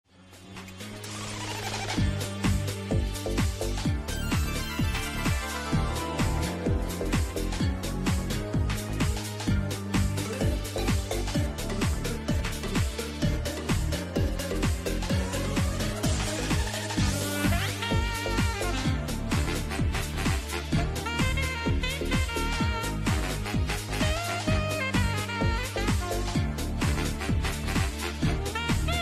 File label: Menu; XenoVerse2